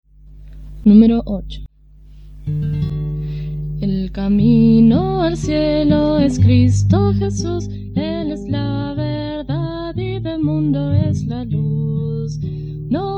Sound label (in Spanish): Coro, himnario niños